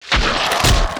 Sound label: Goblin Die